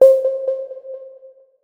Notification

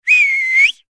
Whistle, notifications; Explay Neo

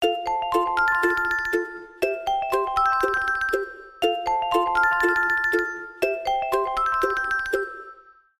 Heartbeat, ringtones; Tecno Spark 7

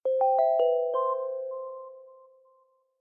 Elegant Echo; Infinix Note Edge; notifications

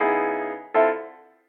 Jazzy; Samsung Galaxy A3 2017; notifications

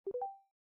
usb, ui; Elephone U Pro

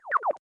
sent message failed; Coolpad 7296s; notifications

